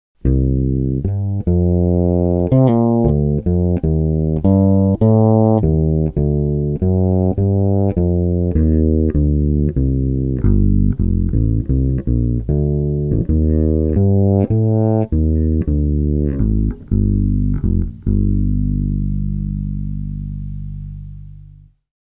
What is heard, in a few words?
pan both, bass; inspire